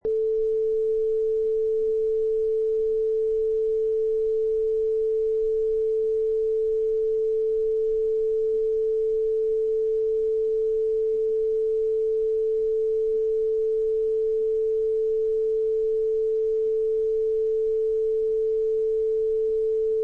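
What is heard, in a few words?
Bedroom Room Tone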